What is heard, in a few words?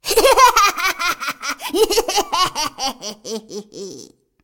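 laugh, Chinese; Fizz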